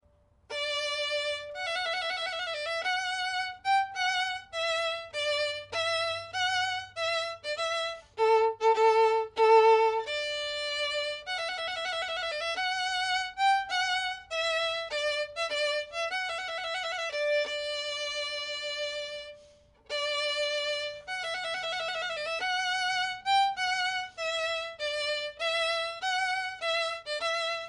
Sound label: trumpet voluntary stately; clarke